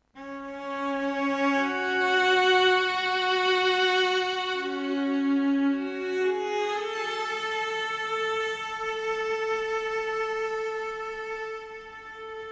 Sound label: Cello; rise of venice windows gamerip 2013